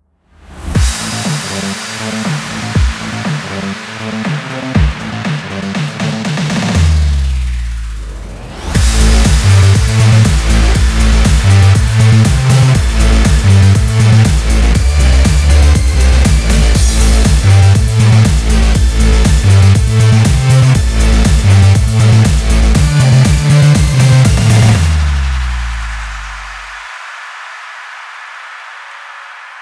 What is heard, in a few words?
stock music